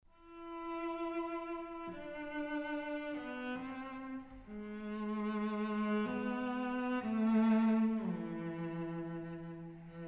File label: piano trio; kiel